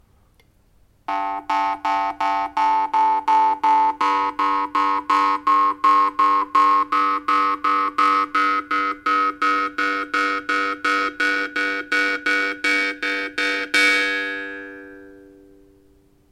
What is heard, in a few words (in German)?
Glottis